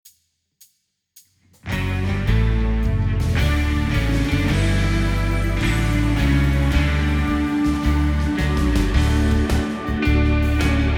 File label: Happy Birthday [Karaoke]; Metal Gear Solid V